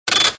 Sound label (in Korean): 아이템; deepsky